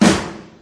tight acoustic snare sound g key, acoustic snare tuned to the G note; snare drum samples